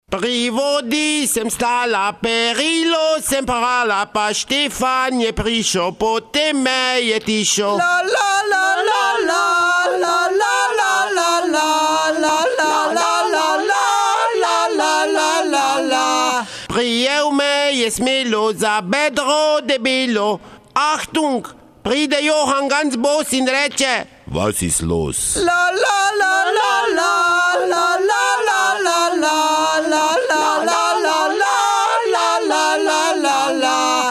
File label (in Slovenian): zvon